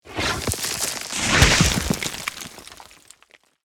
Earth magic spell ground shield; fantasy

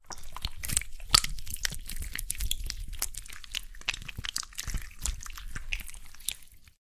Slimy liquid movement squelches

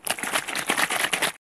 Shaking liquid inside of a plastic bottle

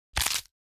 Muffled bone break deep

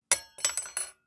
Kitchen utensils drop clatter rummage

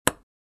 Table tennis paddle ball hit

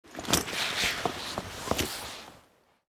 Military uniform cloth movement; Military Equipment

